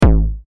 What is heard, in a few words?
kick; misskey; noizenecio